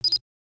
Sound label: Broom Bot Sound, creatures mechanical